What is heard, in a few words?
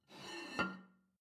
plate take table slide, gastronomie; soundpool